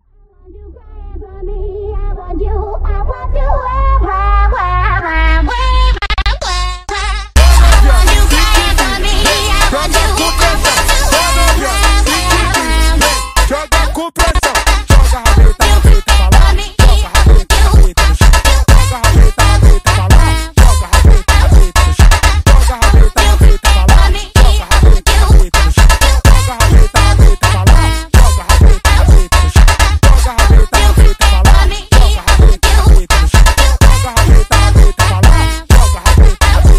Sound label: funk